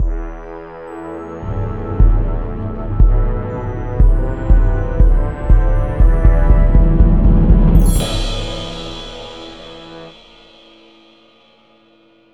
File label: jingle info